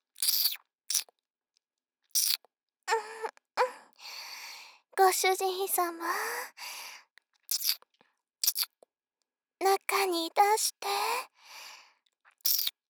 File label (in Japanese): キス